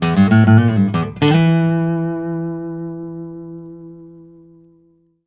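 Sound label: baritone lick E, Blues Guitar; ILIO; Fretworks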